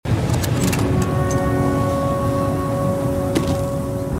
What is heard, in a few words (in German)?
essen; The Flame in the Flood